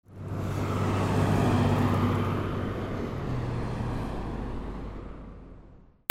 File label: HIGHWAY